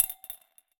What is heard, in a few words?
Drop In Glass, Medium Wine Glass